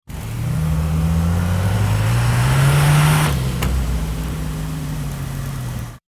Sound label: old car driving